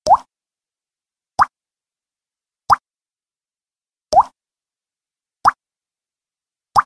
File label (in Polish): Spadające krople wody